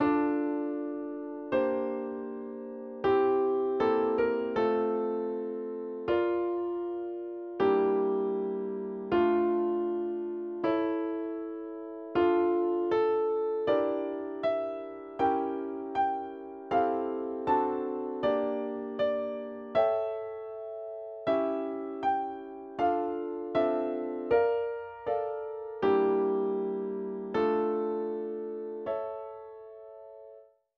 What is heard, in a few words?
instrumental